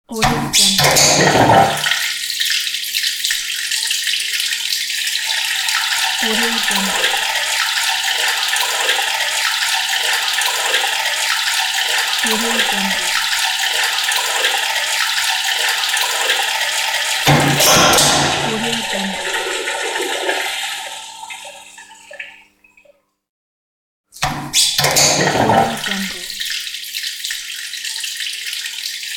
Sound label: water draining